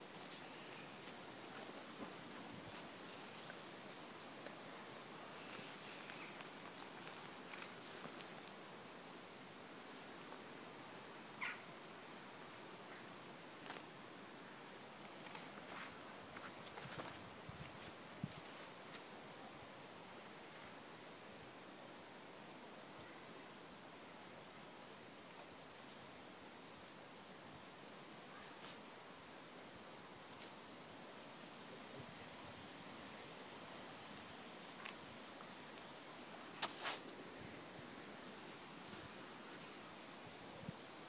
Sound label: rustling trees; from the madding crowd; blickehill park norfolk